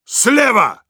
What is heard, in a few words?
Heavy meleeing, ru